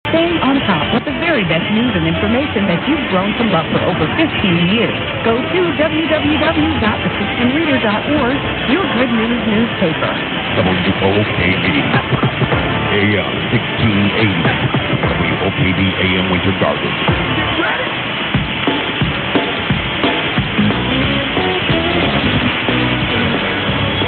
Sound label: 1680, mw dxing; wokb; winter gardens fl